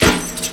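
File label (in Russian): бум бэп, малый барабан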